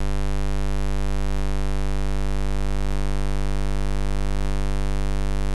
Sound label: HUM Noise 50 Hz, LoFi Noise; Roland BK-5